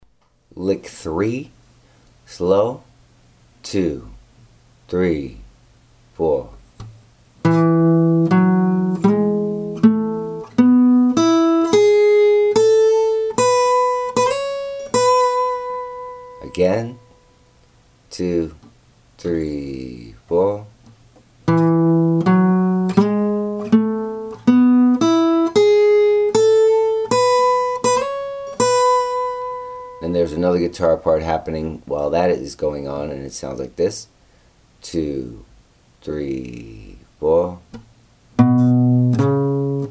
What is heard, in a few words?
lick, guitar